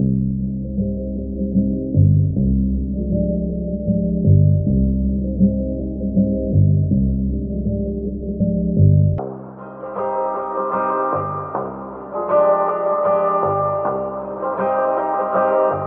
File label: guitar, Jazzmatazz Loops